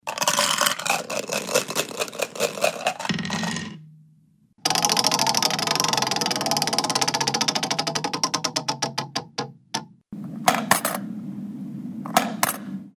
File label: Games sounds